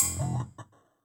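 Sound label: Time Keeper; 300 Drum Machines; Yamaha DTXpress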